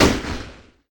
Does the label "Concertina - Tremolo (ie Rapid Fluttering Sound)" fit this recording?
No